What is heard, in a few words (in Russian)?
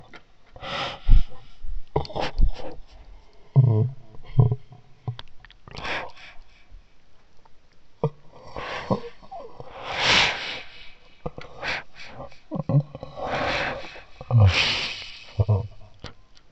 kto to plachet